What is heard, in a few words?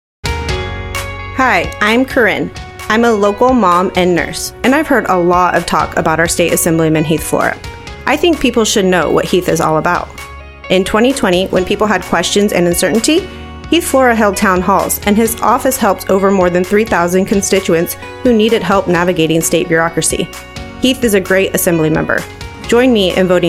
music